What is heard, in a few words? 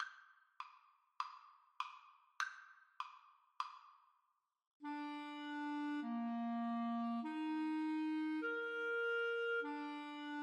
Clarinet duet